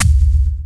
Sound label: PTX TOM; Crypton - DrumBase5000